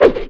claw attack, scorpion; quakemapping

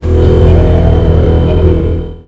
sharp; quakemapping; items

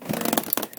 lad rope, player; quakemapping

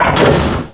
wheel, plats; quakemapping; mod xj18; kinn

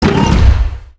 footstep; seeker

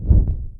torch off; mod slipgate

